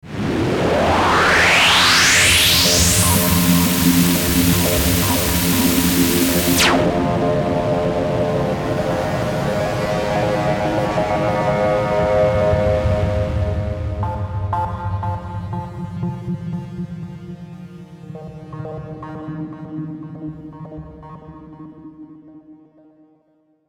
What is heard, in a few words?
Sweeper Airy Distortion Crescendo Synth; Airy Sound Effects; Production Element Imaging Element Accent Transition